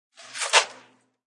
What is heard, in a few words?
Paper Thrown Into Trash, Throwing paper into trash; Classroom; Schools